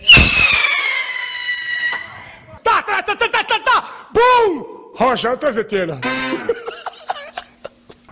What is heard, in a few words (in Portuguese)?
rojao 13 tiros